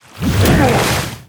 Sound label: Rogue Wave Attack